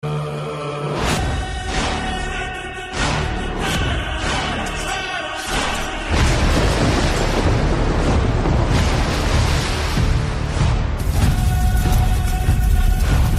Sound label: bgm; chaava